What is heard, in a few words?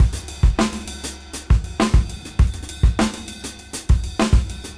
Old School 101 bpm